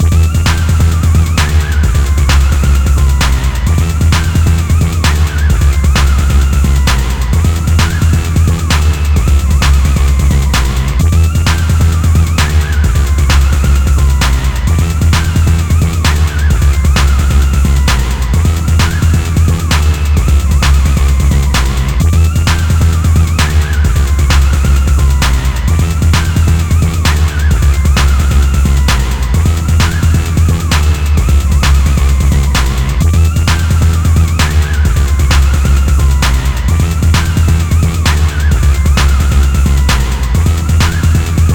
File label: Instrumental; NBA Live 2004; playstation 2